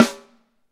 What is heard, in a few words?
SD, KIT Real-Kit; 90 s Sample CDs; Sound & Vision - Gigapack I CD 1 (Roland)